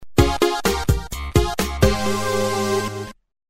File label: Retro, Message tones; LG KU5500